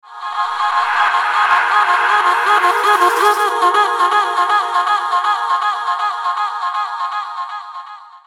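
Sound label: echo sms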